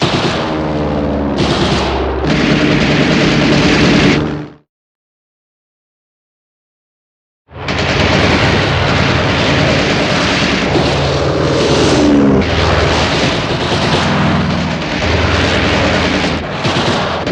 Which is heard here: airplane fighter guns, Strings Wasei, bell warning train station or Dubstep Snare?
airplane fighter guns